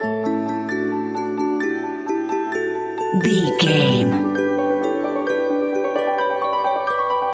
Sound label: whimsical utopia loop